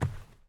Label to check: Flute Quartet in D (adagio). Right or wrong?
Wrong